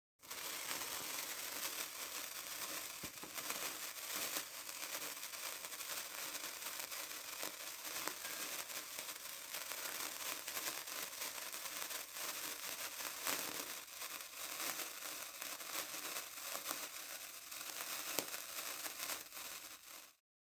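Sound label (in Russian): sound of a burning sparkler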